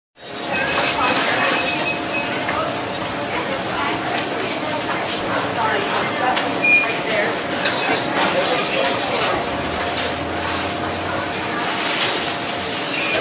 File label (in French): Ambiance supermarché; bruitage